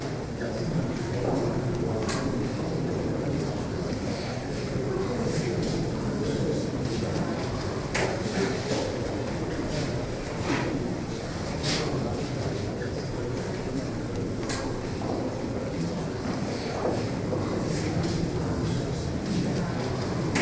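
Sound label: Scottish interior; MARSHALL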